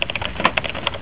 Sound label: keyboard